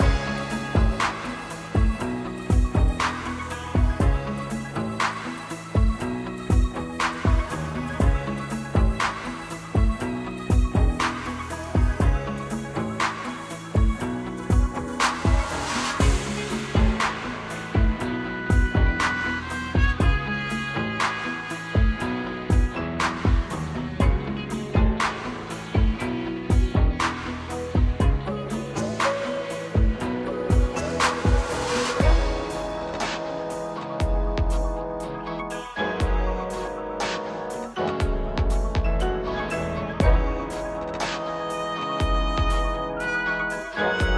bar loop; longmenbar; 明日方舟

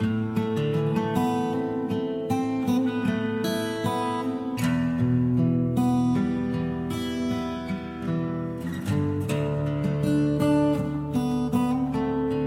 patriot loop

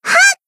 Shout; BA; Mari; Sportsuit

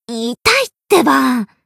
Battle Damage; Rumi

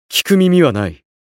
attack; Goke; 刀剑乱舞:后家兼光

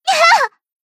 Battle Damage; Maki

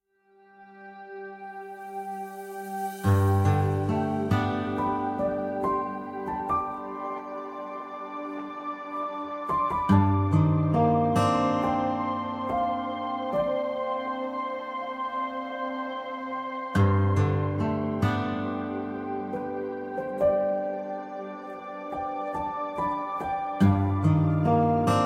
warm intro

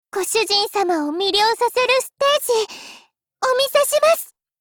battle warcry

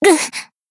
Battle Damage

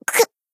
Battle Damage; Kayoko

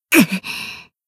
Battle Damage; Rumi

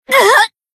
Battle Shout; Marina

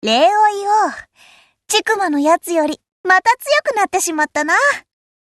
Tone

